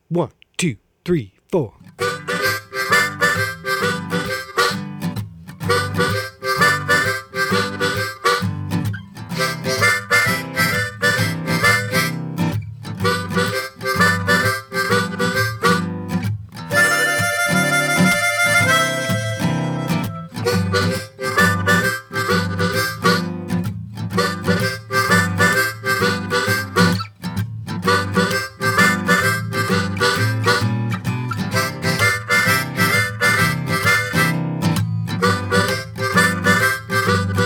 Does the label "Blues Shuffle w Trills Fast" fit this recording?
Yes